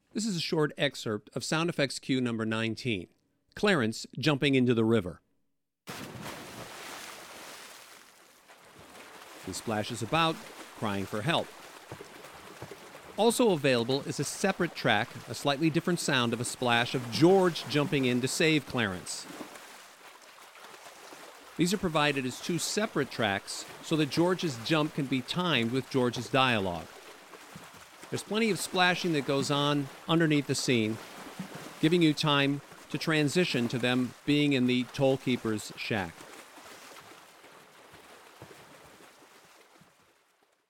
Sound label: jumps in river and splashes about; FX-19 Clarence jumps in river and splashes; It's A Wonderful Life!